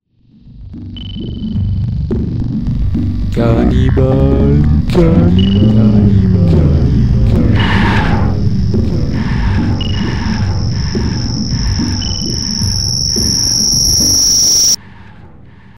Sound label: jingle; JM Charcot